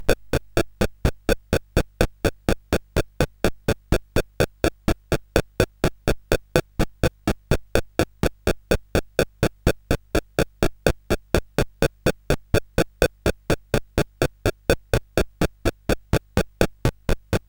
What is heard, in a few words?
CSG8580R5, GAMES; Sammy Lightfoot